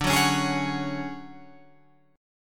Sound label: D Major 7th Flat 5th Guitar Open F, chord; strummed; 14,13,13,15,16,13